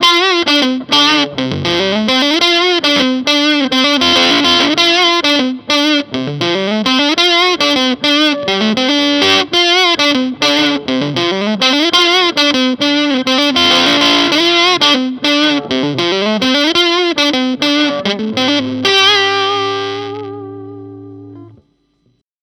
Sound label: Authentic Fuzz Face; Dunlop